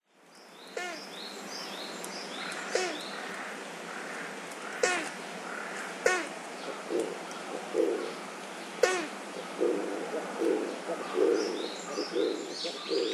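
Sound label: Oriental Turtle Dove, Kokubunji; キジバト; 威嚇; 東京都国分寺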